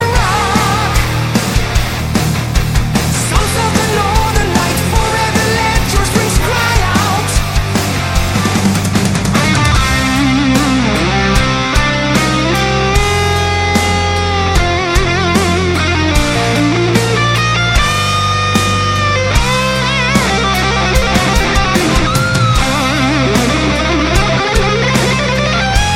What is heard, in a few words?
swedish ringtone